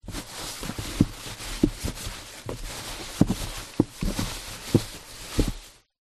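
packaging thin wrap movement inside empty cardboard box; foley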